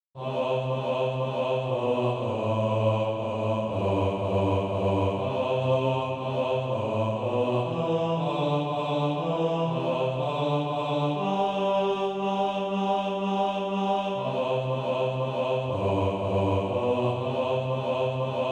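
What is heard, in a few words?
bass, Practice Music; A Cry as of pain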